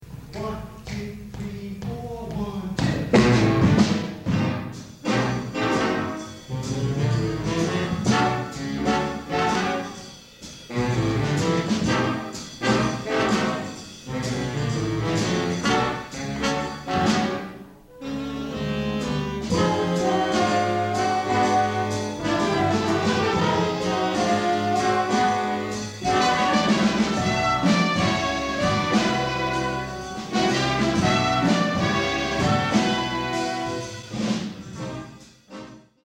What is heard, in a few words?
Jazz; Juan Garcia Music